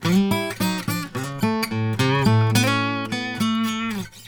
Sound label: 108 HI RUM LICK, Blues Guitar; ILIO; Fretworks